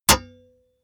metal impact sword plate

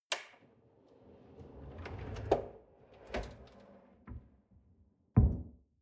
folding door close long

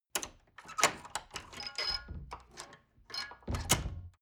wood door old lock handling rattle squeak

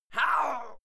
male fight attack; soundpool

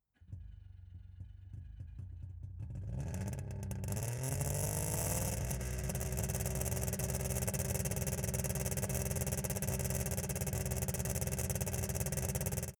car saab 92 startup rpm low exhaust

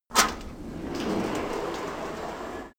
sliding door

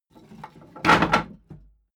wood board drop rumble; objekte; holz

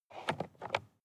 sports car int compartment close; verkehr transport; autos

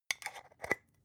spice jar scratch tonal close slow; industrie wirtschaft; gastronomie

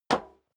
step sneaker metal dry single stair up; schritte

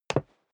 step dress boots wood parquet single stair up; schritte; menschen

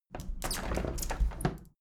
window handle plastic squeak open tilt regular; objekte; fenster

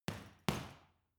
boxing punching bag side hook double medium; sport freizeit; boxen

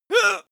male groan pain; menschen; schreie

